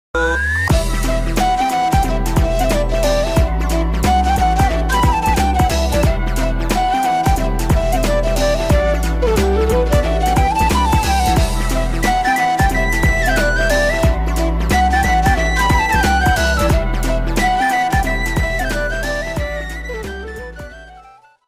ringtone flute music; game

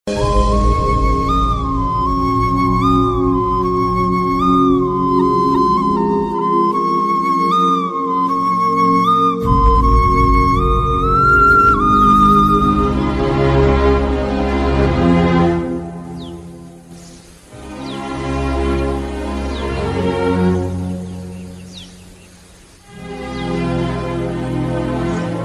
sad bgm ringtone movie tamil bgm

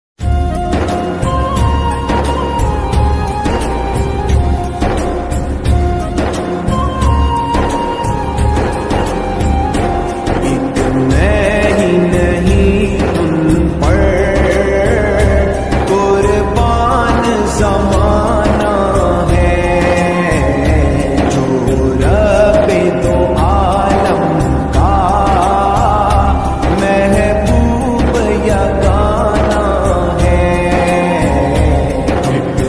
islamic naat ringtone

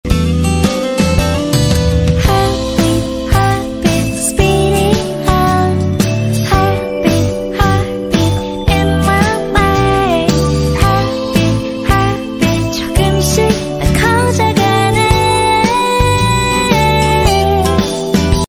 ringtone; strong woman do bong soon ost

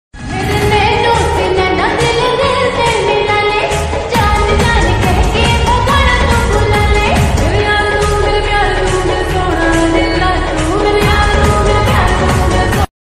ringtone song ringtone

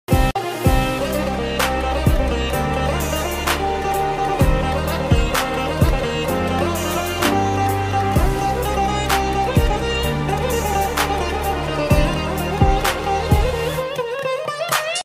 bgm ringtone

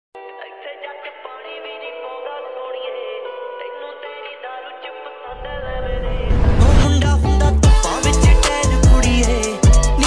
punjabi ringtone romantic ringtone

